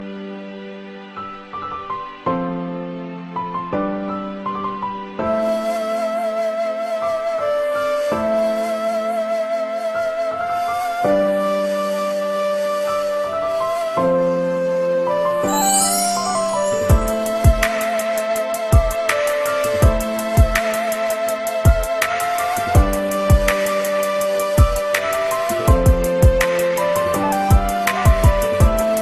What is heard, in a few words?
emotional sad ringtone